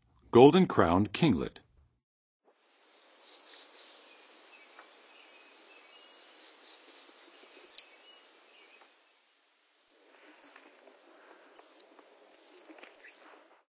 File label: Golden-crowned Kinglet, Western USA Songbirds; North America